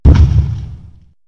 Furrious Stomp

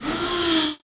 Corkscrew Crash

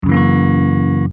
Notes Fs7 Down; Hylics

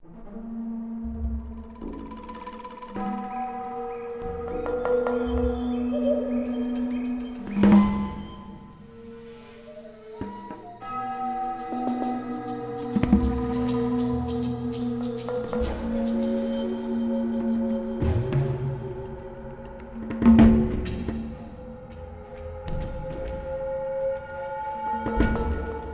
Swamp; Crysis

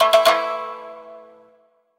shamisen

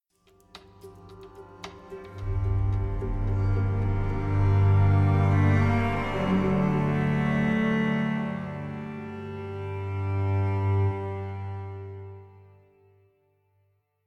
String; Assassin's Creed Unity

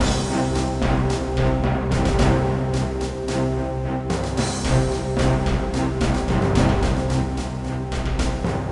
sounds music; Halo Combat Evolved; Xbox

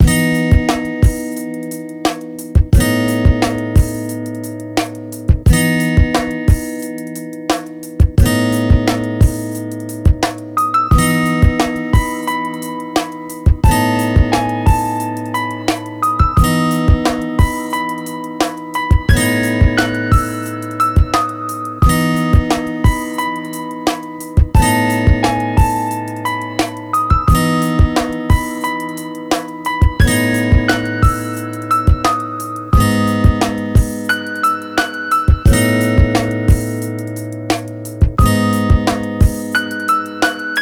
bgm healing; Fox Hime

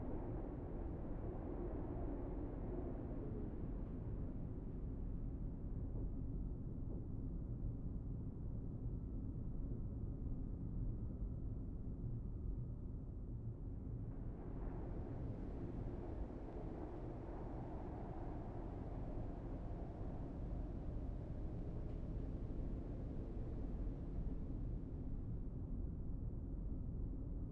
Ambience; Monster Hunter World Iceborne

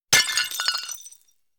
Coin Hit Pots; We Are the Dwarves

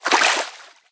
Fish Landing; The Denpa Men; Android

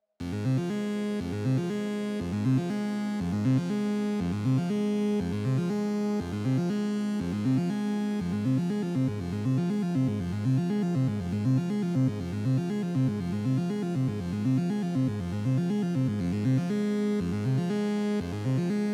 Red Fog Analog Soft; Get Even

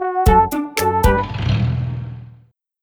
HOME Menu Jingle; Mii Moving Software

